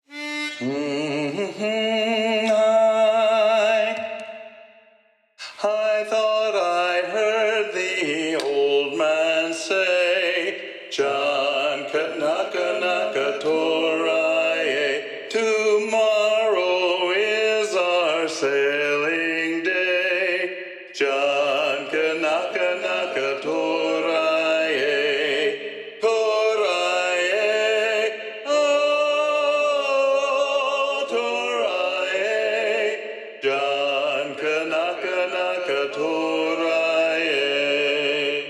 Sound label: John Kanaka (Don Kanaka) Lead Predominant, sea shanties